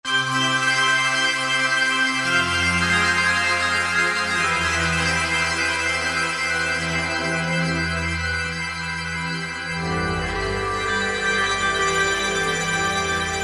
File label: Air Waves, E-mu Morpheus; Factory Presets